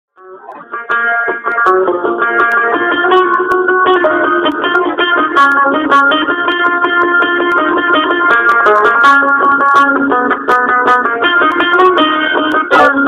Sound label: Rabab